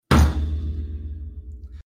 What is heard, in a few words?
slam